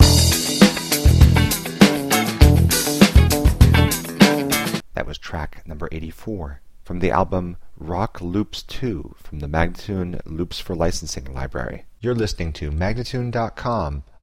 100 E spoken, Rock Loops

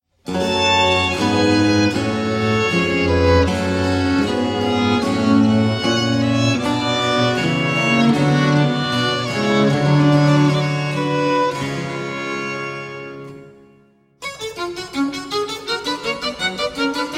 Sonatina (Adagio - Presto), spoken; Mensa sonora Pars VI (C 74); Simplicissimus Ensemble

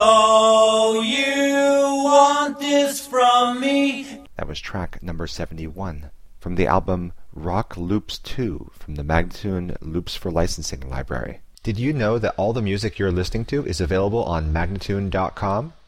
A spoken, Rock Loops; spinecar; Loops For Licensing; MagnaLoops

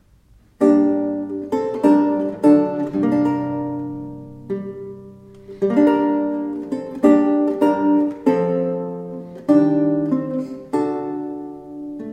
Polish dance, Lute; Matthaeus Waissel

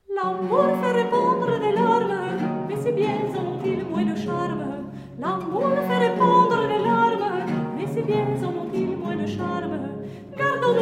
Bourree; Michel L'affilard; Airs De Cour Francais Du XVIIe Siecle; Trio Dafne

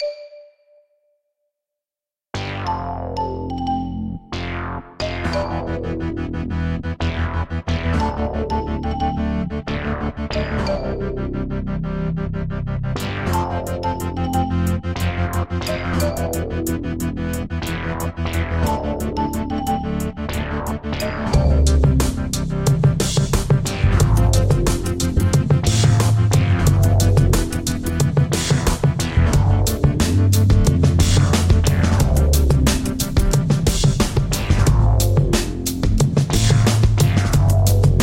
Funk Soul Beats; Sewer Funk

